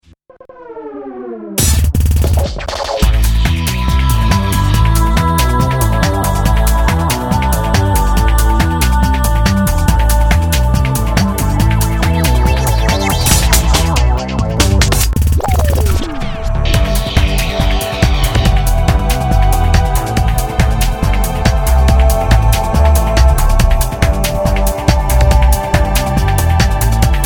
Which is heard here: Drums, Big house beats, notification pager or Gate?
Big house beats